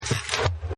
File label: Factory; Factory 14